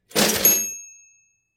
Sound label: register opening; soundscrate